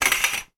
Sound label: household curtains pulled; sound effects one